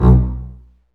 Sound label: STR CB, Cb Bowed, Cb Marcato